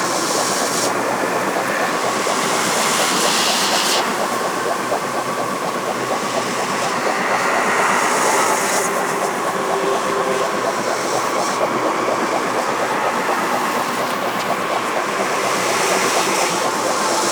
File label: Orb Loop; Warframe; Zariman; Survival